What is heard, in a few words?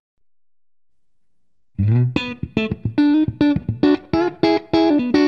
SHR1 Neck Mid Clean; guitare; micros pour guitare